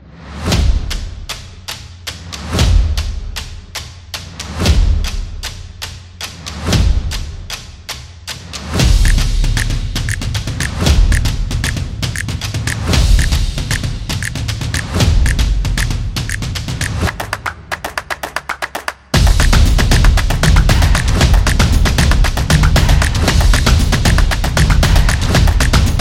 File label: Drums